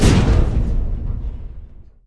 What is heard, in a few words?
horizontal stop, crane; hl2; doors